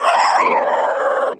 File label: wookiee f attack; afflicted